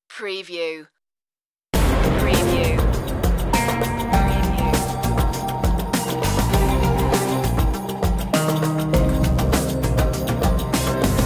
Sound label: underworld series dramatic cue